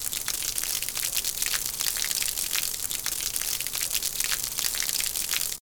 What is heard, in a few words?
Water Hose Stream Loop